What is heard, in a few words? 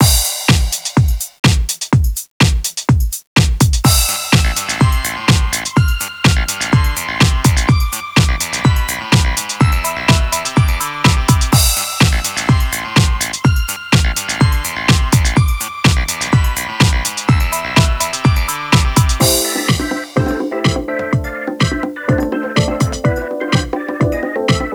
Bass Dive tunnel 125; Radiohammer; Nintendo 3DS